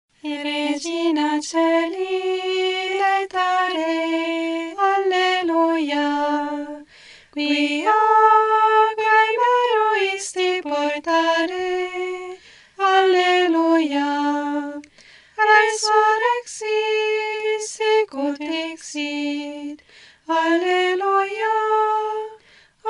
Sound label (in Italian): Regina Coeli cantato